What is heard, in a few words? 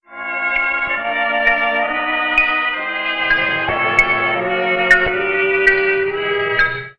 strings and piano timing is off, sad; Loops